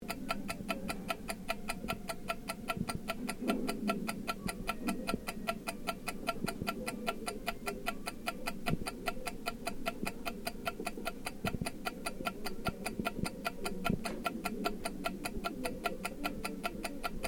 CLOCK CHART ROOM OPERATING, Sounds onboard HMAS Castlemaine